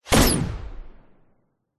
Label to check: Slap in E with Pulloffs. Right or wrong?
Wrong